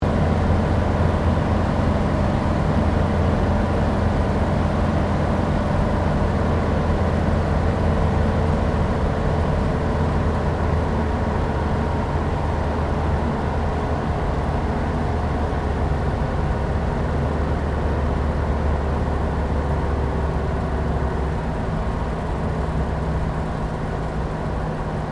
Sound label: AIRCRAFT SUPERMARINE SPITFIRE MK-XVI LAND INTERIOR STEREO; Airplane Sound Effects